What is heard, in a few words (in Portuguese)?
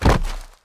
som de queda